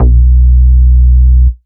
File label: Root B, Ultimate Bass; Vengeance Samples